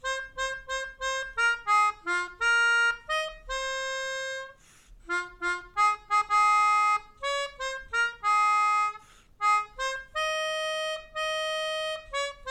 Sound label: Melodica